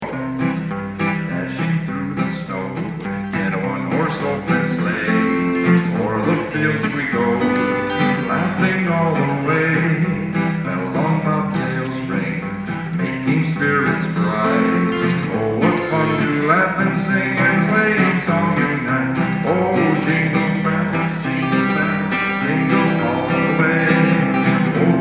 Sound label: Jingle Bells